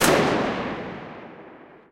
mg 8mm dt last shot; guns; War Thunder

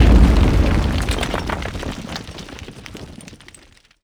stones scatter heavy end

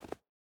footstep hide snow jump start; Rust

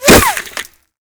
impact masonry; Modern Warfare

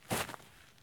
jump grass low; Escape from Tarkov

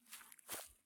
turn grass low; Escape from Tarkov

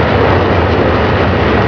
rpg7 loop, weapons; Modern Warfare

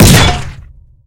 tank hit small unpierce; tanks; War Thunder

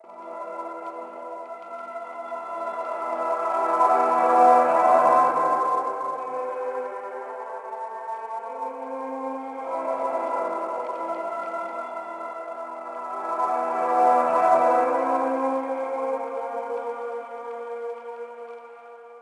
gramaphone; World of Tanks Blitz; ambient italy

